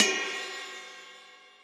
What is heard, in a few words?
crash, Millenium MPS-400